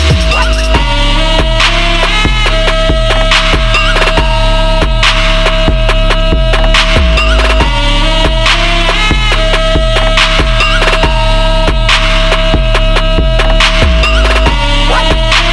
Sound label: filthy sax